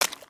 Foot Mud Walk Light; footsteps

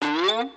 Frog Hop; creatures organic

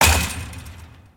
Hit Chain Fence; creatures mechanical

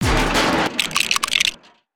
Metallic; destructibles

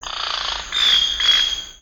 Bird Amazon, ambience avant gardens; Lego

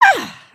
Ballerina, emotes

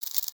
Rat Movement; creatures organic

